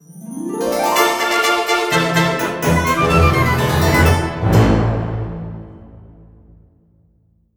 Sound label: Victory Sting; fat princess piece of cake; ps vita